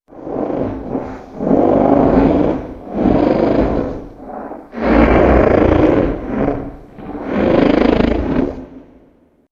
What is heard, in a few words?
choke; gonarch